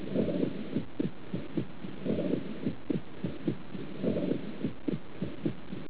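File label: tubular, pulmonary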